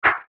Beep Tone Signal Multimedia

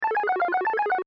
Video Game Music Chime Vintage Eight Bit; Technology; Video Games

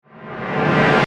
Transformation, Transporter, Science Fiction

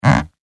Creaky Wooden Floorboard

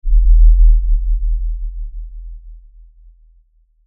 Impact Lfe Rumble

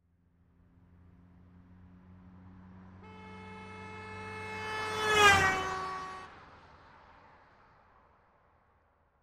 Ford Escort 1986 Drive By Right To Left 55 Mph; Transportation Automobile; Horn; Car Sound Effects; Blastwave

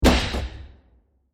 Short Metal Impact With Scratch; Burst; Impacts; CraftedSignals

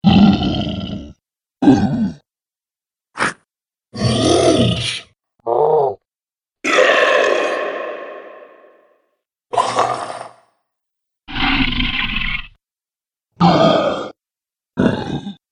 Monsters; Monster Sound Effects; horror

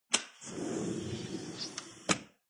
Aluminium Window Slide Open; Household

